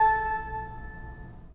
Pipe Mix Celeste, 3D Organs

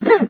FRET, FINGER MUTE; Xsample VOL-1

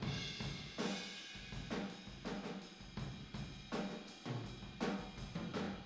Boogaloo Fill Room ST, 82 BPM, Drums; Sonic Foundry (Sony Creative Software) - Drums from the Big Room The Mixes

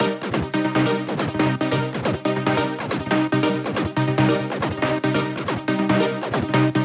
140BPM C; Vengeance - Essential Clubsounds Vol.4

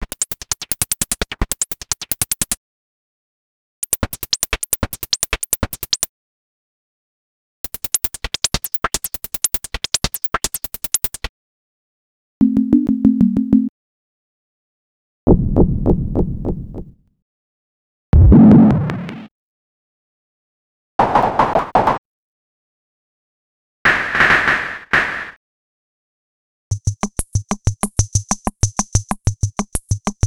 FX+Loops; X-Static Goldmine 5

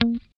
GUI A3#, E-GUI MUTED; Sound & Vision; Gigapack I CD 2

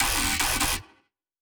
Metallic bass E

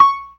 PERC, ORGAN; Keith Emerson Signature Series

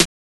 Snare 808; Mortal Kombat 1; Genesis

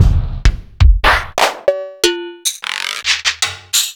Alesis Andromeda A6

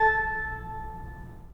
Pipe Mix Celeste, 3D Organs

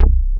HARD C2, BASS; Q Up Arts; Dream Experience

